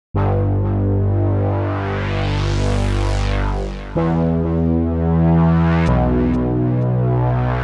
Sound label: BASS Reese